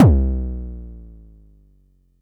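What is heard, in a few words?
Long bass drum, Jomox Airbase99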